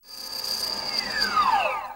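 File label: Fast Whine Down Cartoon, servos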